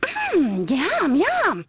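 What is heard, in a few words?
female yum; People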